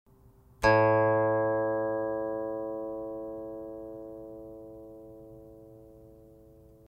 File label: guqin; chuYinKouXian